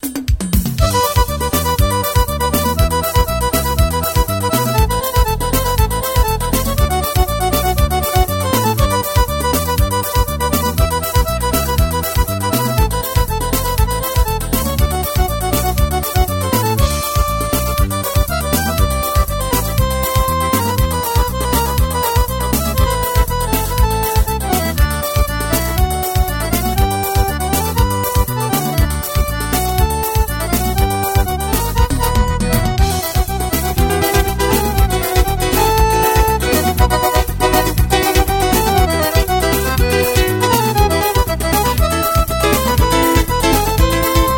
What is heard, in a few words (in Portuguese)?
forr inst